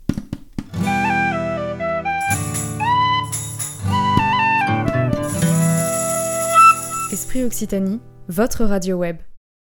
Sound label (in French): Jingle; Esprit Occitanie